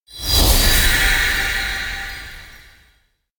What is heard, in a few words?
sky high resonate; anemoi